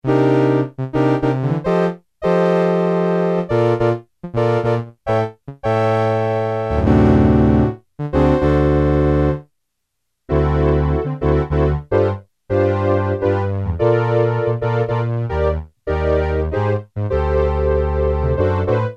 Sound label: brass, Technics SX-K450